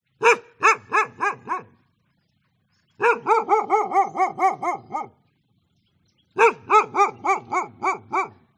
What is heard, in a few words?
dog bark some bird noise vietnam